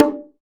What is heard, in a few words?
Bongo Low, Roland BK-5; Percussion